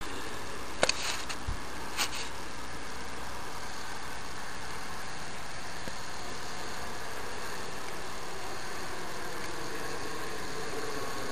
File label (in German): Flug Slow